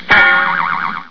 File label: BLOINK